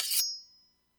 machete vs spatula

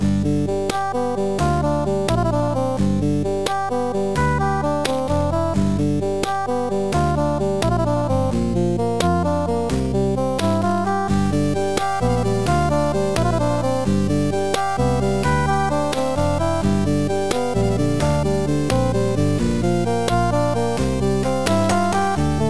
CHIPTUNE Loop Minstrel Dance

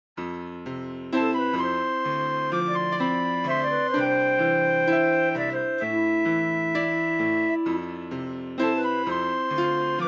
piccolo piano melody

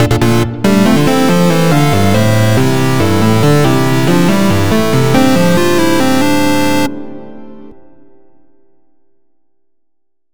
achievement get

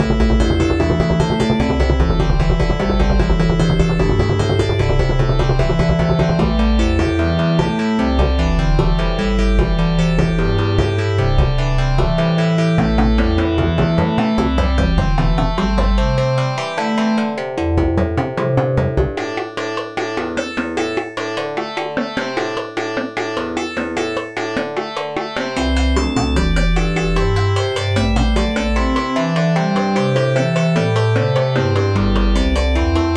Looping Japanese fight track; BeepBox

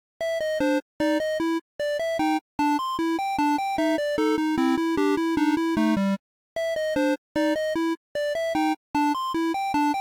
Crazy, Only a Crazy Chiptune Loop

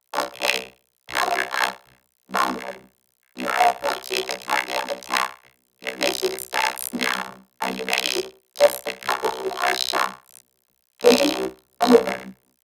robot

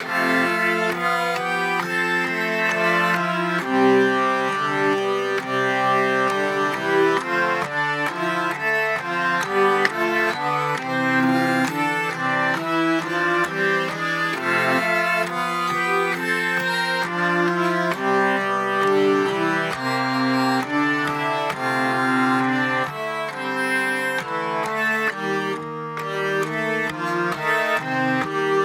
Lullaby Hurdy Gurdy Loop

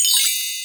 shimmer

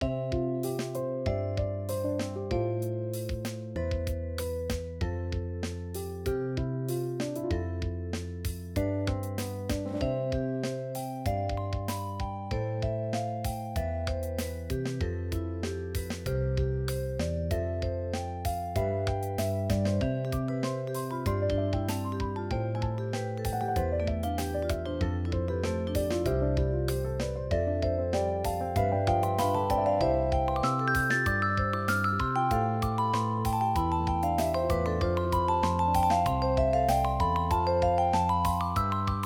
canon in d chill arrange

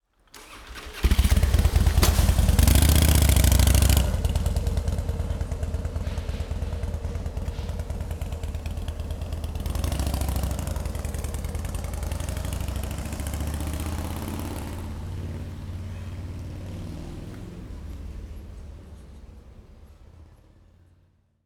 motorcycle engine - start and drive away; General Ambience

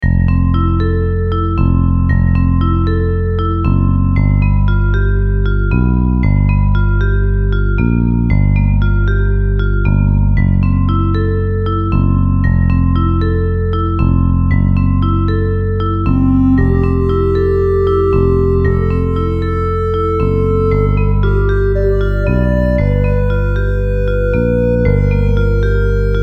relaxed village

